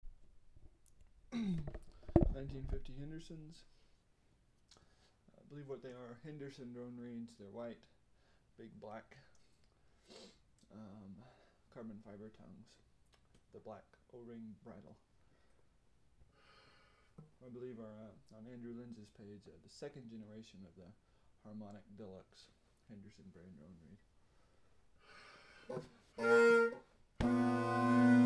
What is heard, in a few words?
1950’s Henderson + Henderson Harmonic Deluxe 2nd Generation drone reeds, Bagpipe; 1950’s Henderson bagpipe made of african blackwood